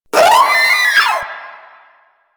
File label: Proto Infested vocal short attack